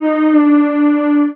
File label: vocal sunup, Bb; SD AURORA Sample Pack; Slate